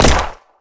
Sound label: Shooting; DungeonShooting; 完善武器音效